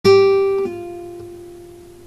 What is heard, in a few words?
pull of